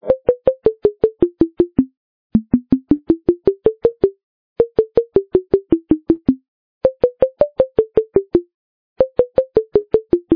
mex hat dance

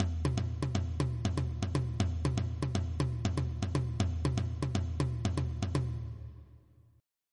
bossa; traditional

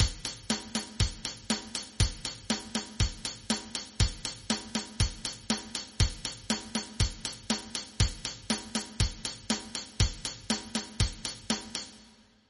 surf, rhythm; traditional

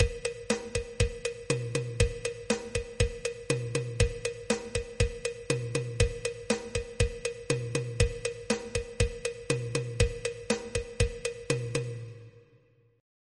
latin rock, rhythm; traditional

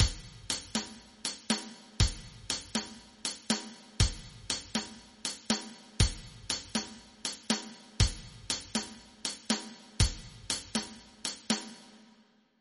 middleeast; traditional

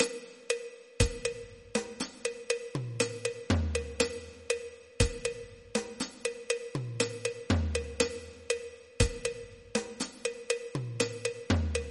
mozambique, rhythm; traditional

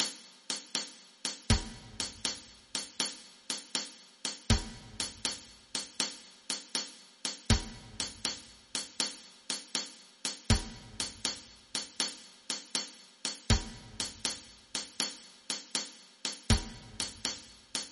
reggae, rhythm; traditional

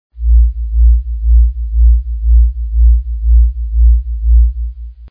warp drive engine

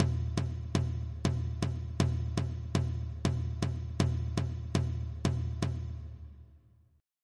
bossanova, traditional rhythm

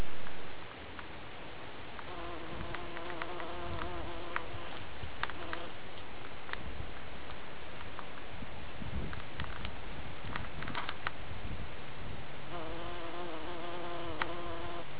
buzzing bee

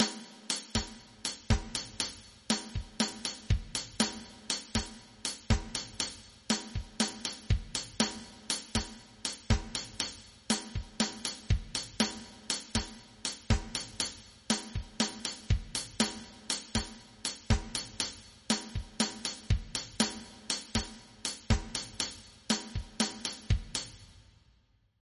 cascara, traditional rhythm

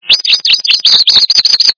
warbler

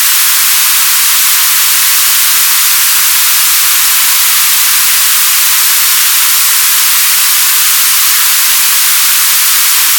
pouring rain

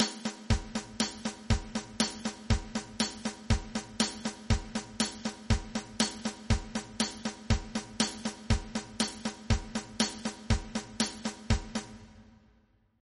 heavy metal, rhythm; traditional

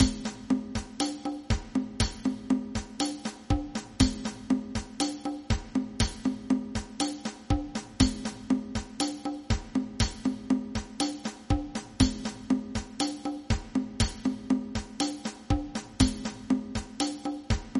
conga, rhythm; traditional

